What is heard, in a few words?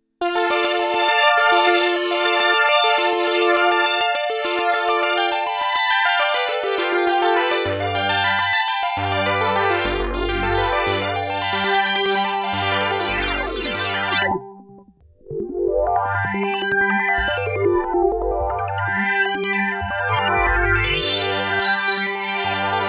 Arpeggio, Korg PolySix; Tweaks; Lara Croft's Arpeggio